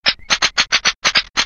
Defective Turret dryfire; sp sabotage factory

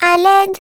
Turret pickup

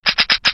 Defective Turret dryfire, ru; sp sabotage factory

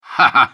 laugh; Cave Johnson

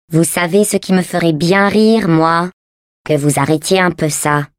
laugh, fr; GLaDOS; taunt

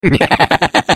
laugh; Defective Turret; sp sabotage factory defect

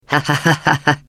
laugh, de; GLaDOS; [Lachen]

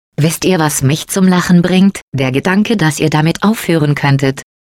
laugh, de; GLaDOS; taunt

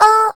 Turret collide

